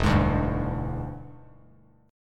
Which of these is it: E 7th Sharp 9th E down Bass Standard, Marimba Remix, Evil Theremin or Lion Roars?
E 7th Sharp 9th E down Bass Standard